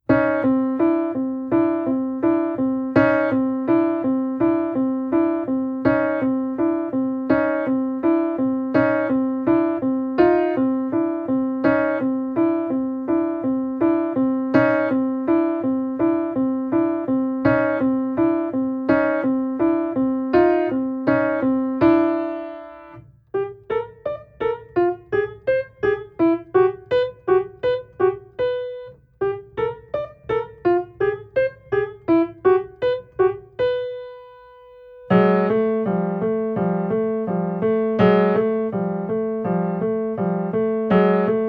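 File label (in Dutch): Vingercombinatie tweede en derde, piano oefeningen; Vingercombinatir tweede en derde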